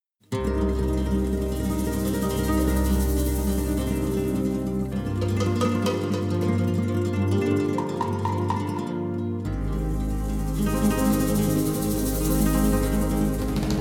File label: Rumba, spanish guitar